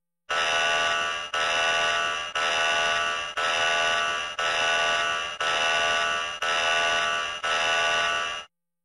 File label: warning sound; megaman x6